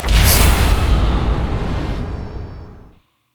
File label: spectral; LoL; yorick